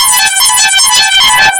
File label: Trombone Effect, effected trombone; Sonic Foundry (Sony Creative Software); Jazz Solos and Sections